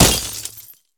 grenade glass; STALKER; IWP

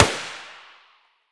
mdr muzzle mono far, weapons

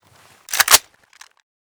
p90 misfire, weapons

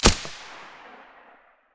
vss silencer stereo, weapons

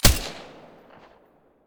mdr silencer stereo, weapons

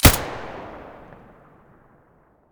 aps muzzle stereo; STALKER; IWP

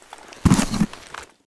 rifle mask use; STALKER; IWP; item usage

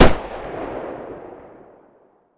muzzle m700 mono far, weapons

